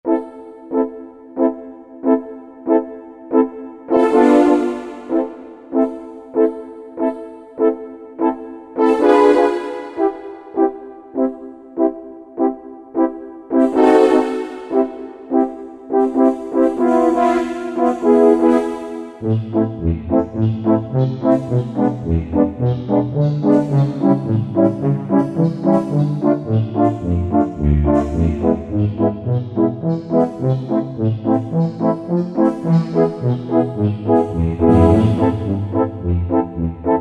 horn; Korg TR-Rack

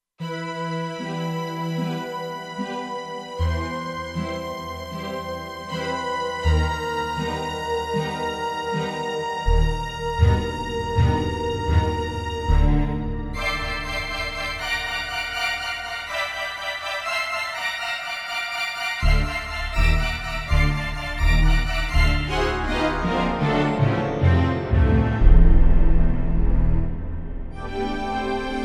Kurzweil K2500X; Factory Setups

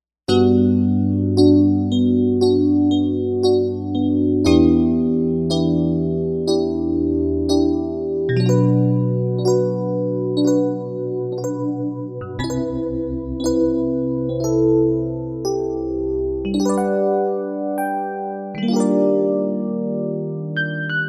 Full Tines

